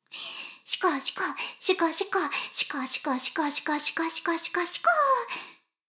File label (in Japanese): しこしこ音声; 少佐殿; 牧場戦腺